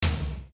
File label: instruments drum tom; maoudamashii